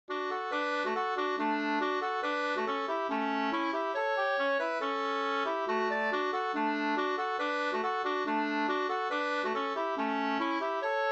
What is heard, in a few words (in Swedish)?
schottis; de aldre Kvistpojkarna Sd